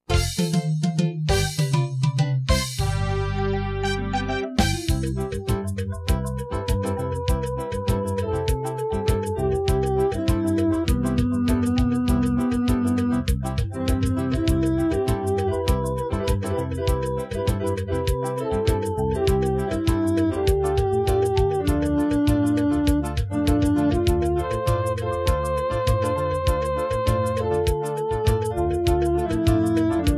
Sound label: NO NOISE